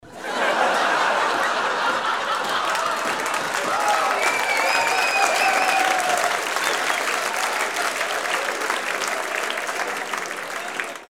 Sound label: laugh applause